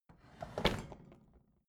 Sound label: bathroom drawer open regular